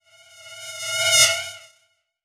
Transition Reverse Whoosh Reverse; Production Element

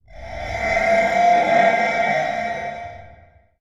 Transition Metallic Drone

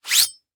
Weapon Sword Remove From Sheath Cloth; AdobeCC

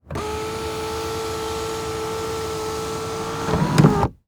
Car Monte Carlo Close Sunroof; transportation

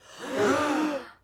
Human Crowd Gasp Soft

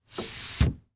Chevy Trailblazer Close Sunroof Cover; transportation

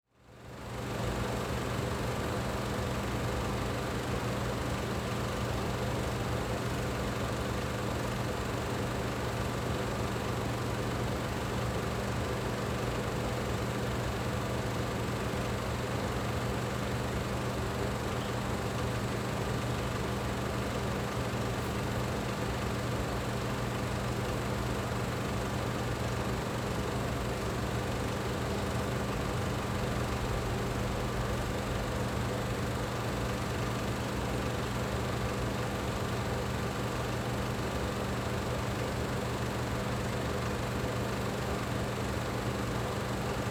Ambience Theater Film Running; AdobeCC

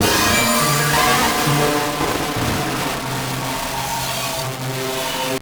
Distortion Musical Tones; AdobeCC; Imaging Elements; Module Production Element Title Imaging

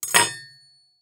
Spoon Set On Wood Table Setting Table, Household

